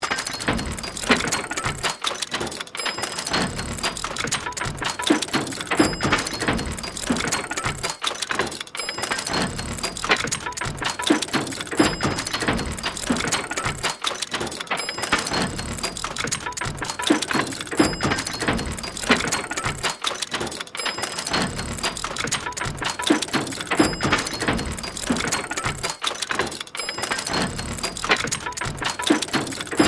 Steampunk Workshop; Steampunk Sound Effects; Steampunk (Sound Effects:Science Fiction)

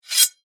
Knife Scrape, Blade, Metal, Fast; Warfare; Knife Sound Effects; Combat; BlastWave

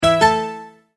multimedia tone verified; Sound Design; McKinney Sound

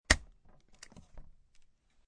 Stapler, Single staple going through paper; Offices

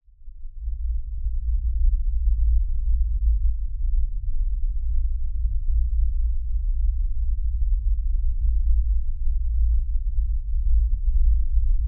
Impact Lfe Rumble; Swell; Low Impacts; Sound Effects:Impacts; Blastwave

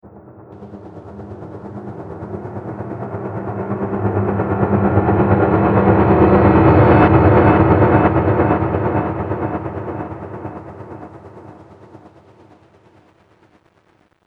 Horror Piano Tremble, Piano Sound Effects

